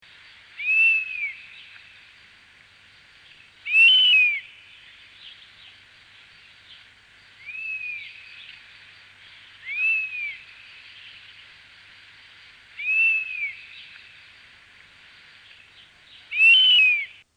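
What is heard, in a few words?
Honey buzzard; Madarak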